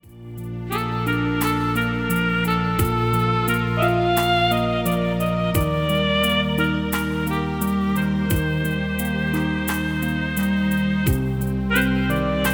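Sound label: saxophone, BACKGROUND MUSIC FOR VIDEOS; saxophone demo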